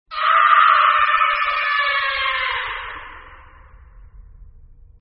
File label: Death Scream; war3source